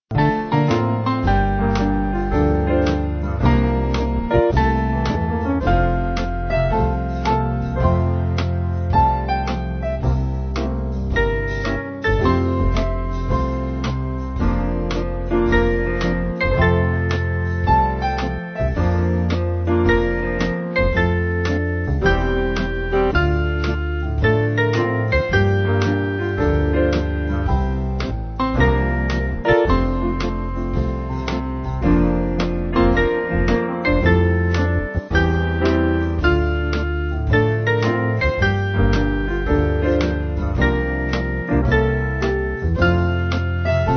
Band

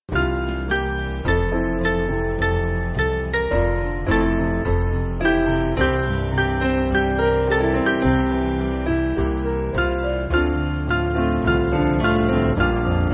Piano

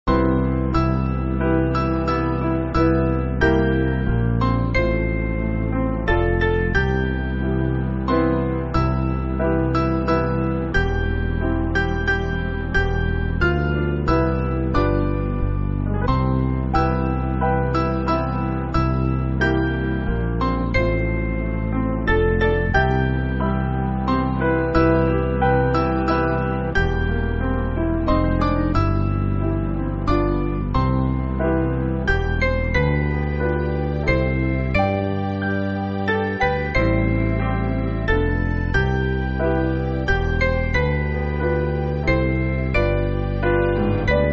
Piano

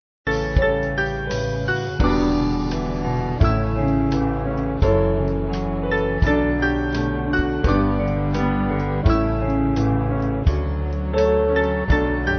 Band, Slow

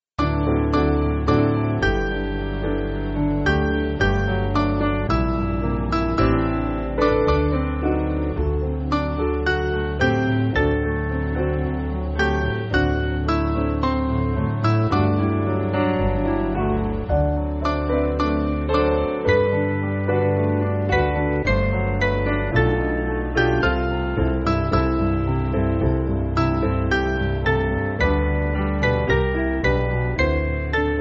Piano

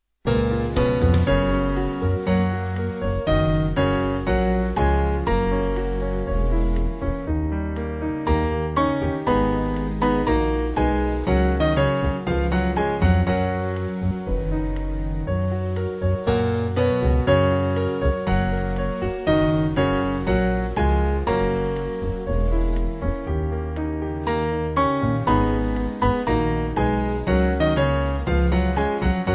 Band

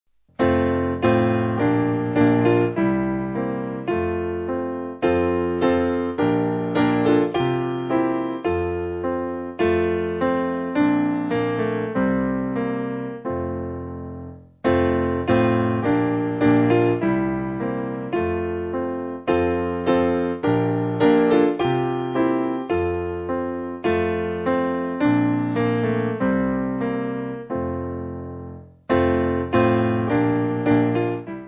Piano

